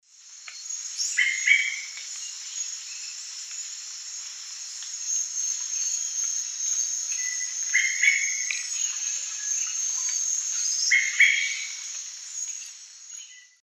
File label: Turdus lawrencii, calls; S. America; Eastern Lowlands